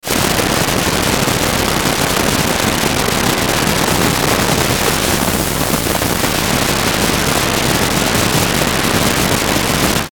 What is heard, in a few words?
Static Distorted Wind Chopper; Production Element Imaging Element Accent Transition; Blastwave; Static Sound Effects